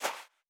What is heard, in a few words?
Footstep Sand Walking